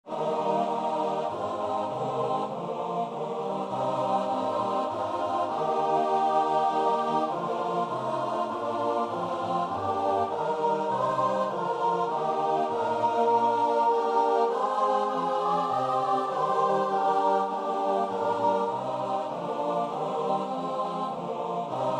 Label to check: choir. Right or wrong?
Right